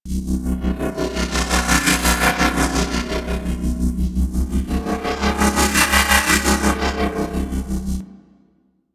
Ship Hologram Loop; Warframe; Corpus Ship Remaster

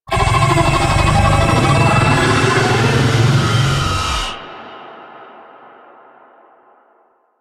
Arachnoid Camper Vocal Death; Warframe; Corpus

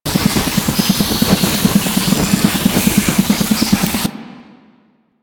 Inf Boomerang Loop; Warframe; Weapons; Infested

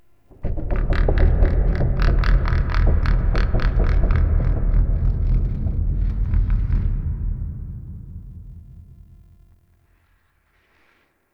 Root Cave Low Creaks; Ambience; One Offs; Neathuns Root Cave

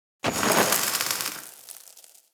Spark Shower; Fortuna; Warframe; Venus Open World; Venus Solaris United

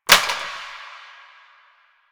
Plastic Drop; Warframe; Nineteen Ninety Nine

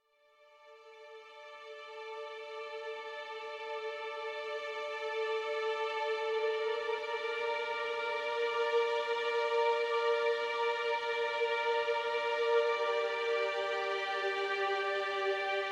Hub Amb Cue, Music; Warframe; DE; Dynamic Music; Entrati

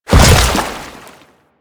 Land Water, Footsteps; Warframe; Grineer

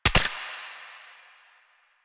Pistol Mech; Warframe; Tenno; Lasrian

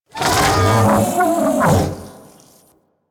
Pain, Infested; Enemies; Barks; Warframe; Ancient Shell Agent

